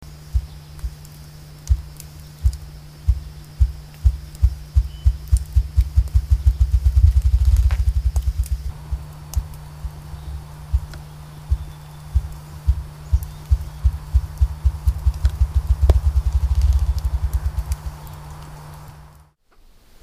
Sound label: ruffed grouse drumming hen calling brood chicks